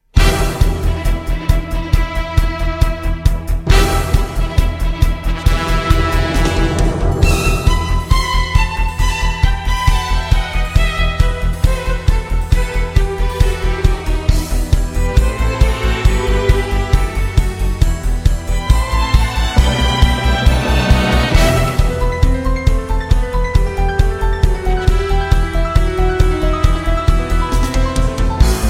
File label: Theme Song; Hibari